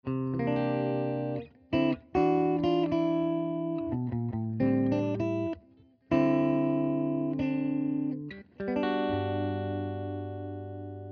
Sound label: Les Paul